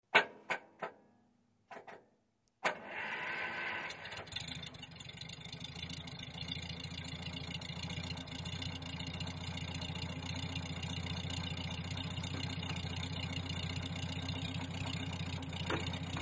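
Buick, Rough Start close up, Idle, Rev Up To Higher Rpm, Smooth Idle, Away Medium Speed with Acceleration, antiques; 1938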